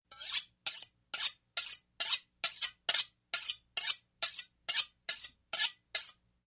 Sharp Carv Knife Fst, utensils

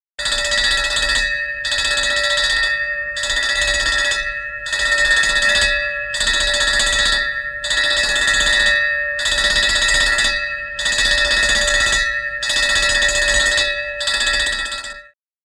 Bell Ambulance, bells

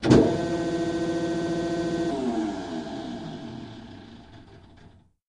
Hydraulic Notcher M, factory

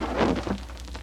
Torch Whoosh Light H; torches

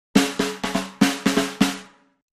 Drums Snare Accent

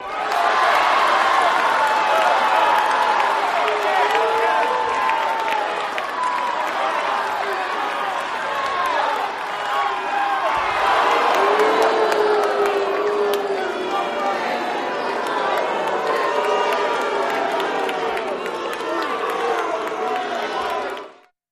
Crowd Walla, boxing

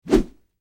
Slow Pipe Or Heavy Obj, whooshes

